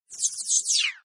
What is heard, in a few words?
Scifi Production Element Lazer Sweeper